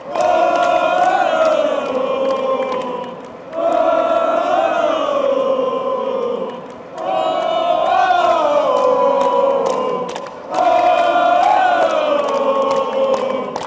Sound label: tune, fanchants